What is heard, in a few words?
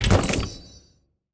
SFX Loot Chest Open; Android; Portal Quest